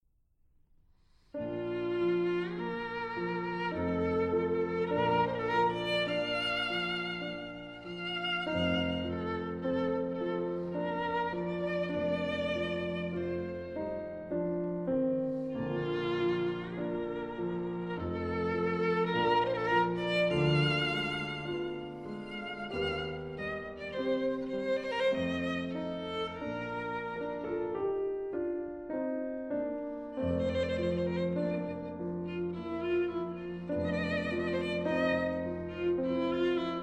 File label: Piano Violin Grand Duo D minor Andante; Woelfl; Op31